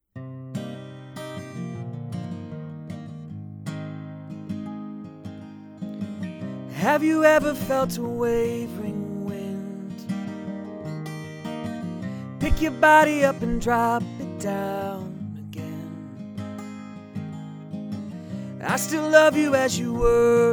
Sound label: Music Apogee MiC Plus, usb mic